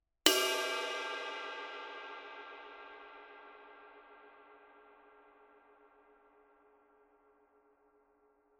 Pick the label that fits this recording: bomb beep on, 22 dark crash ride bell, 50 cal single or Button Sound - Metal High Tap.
22 dark crash ride bell